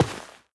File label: step light, footsteps, player, carpet